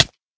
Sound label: hit, damage; EaglerForge; minecraft